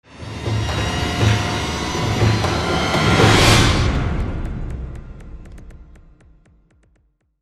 STRING SWELL; transformers war for cybertron gamerip

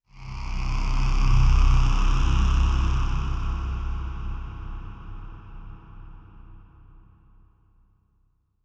synth stab swell low scary; a dump in the dark

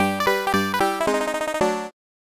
Game Clear; Super Famista 4; SNES

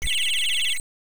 Whistle; Butasan; arcade

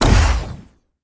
Sci Fi Explosion

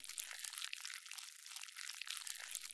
Big Monster Footsteps

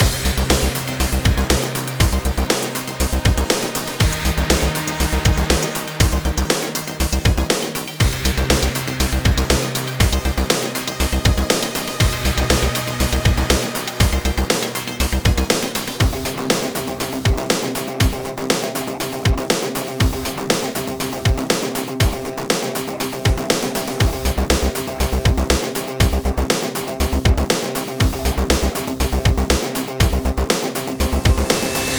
Gravity Gun (Short Loop); Arc Savior; Colony Raid

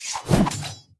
Generic Combat; Kingdom Rush Vengeance; tower defense

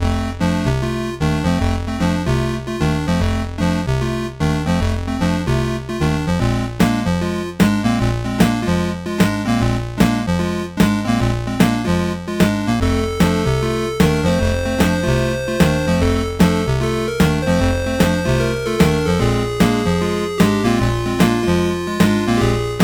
Retro Blues; Tiny Big; Grandpa's Leftovers

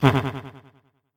huff; Knuckle Sandwich

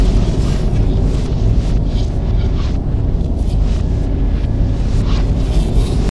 Teleporter

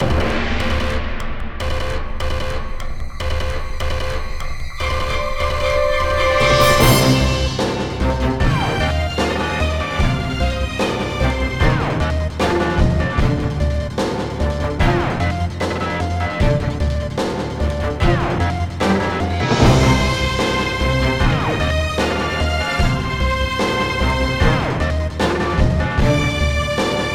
Music Vehicle Chase

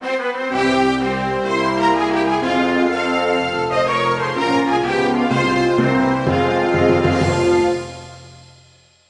Anthem Turkey; Buzz! The Mega Quiz; PS2

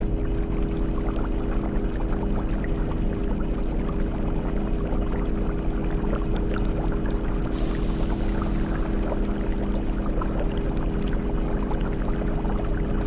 Amb Juice Lab

